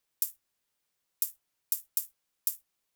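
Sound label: Hat Closed; VariBreaks; hydrogen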